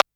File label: Rim Clap, Drums